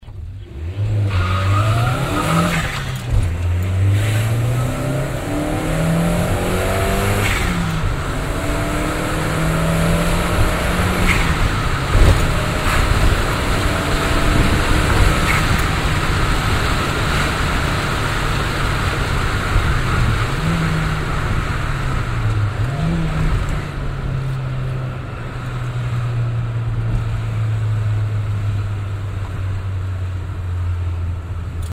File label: exy10 turbo moving, muffler; car